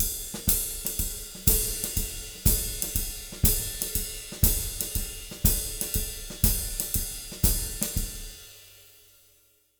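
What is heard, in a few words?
120 SHUFFLE, Jazz Latin Drumloops; USB Soundscan vol.08; AKAI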